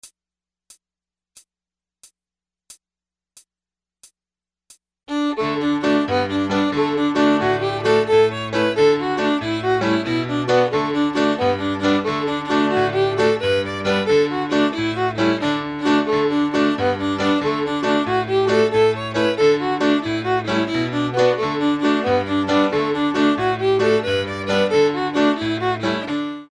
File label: jig